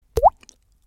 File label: boop